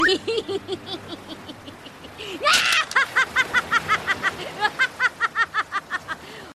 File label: Laughter; Luffy